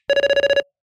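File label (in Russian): звук домофона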